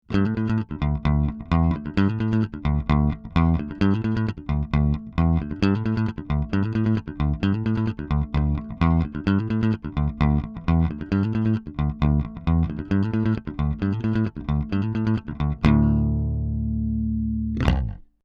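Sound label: Bassman 300 unprocessed; fender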